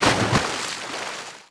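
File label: splash medium; water splashes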